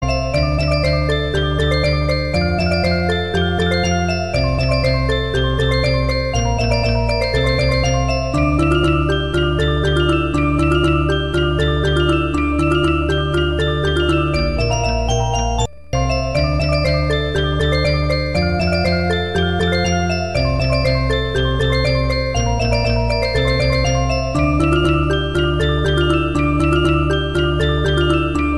Music Box

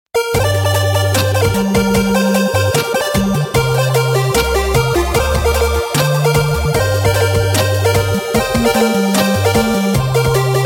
8bit; intruder; alternative

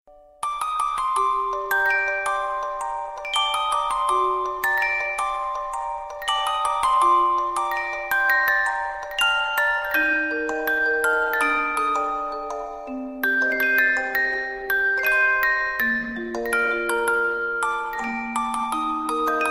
beautiful music; classical

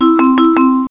DC Metro Door Chime

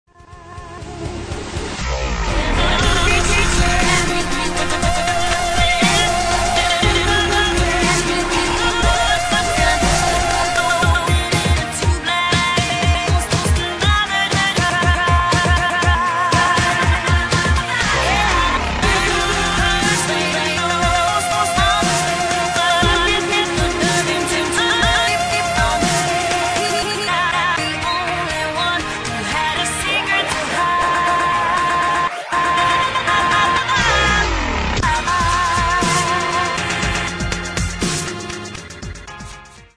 dubstep remix

